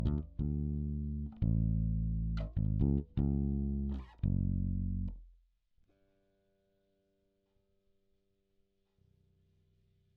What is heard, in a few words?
Bass DI